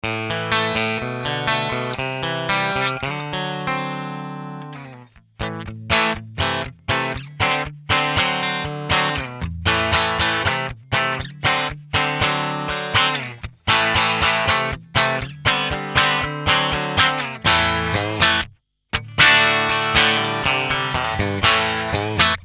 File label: rolling mill bridge clean